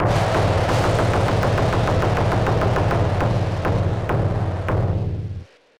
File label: Hit